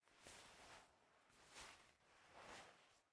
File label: Chalk Draw; game audio; Mita; MiSide